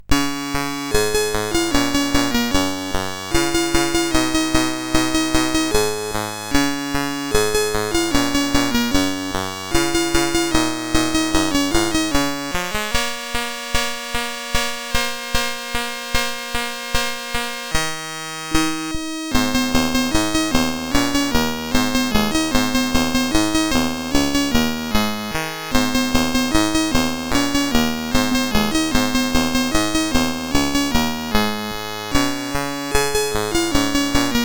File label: sid, CSG8580R5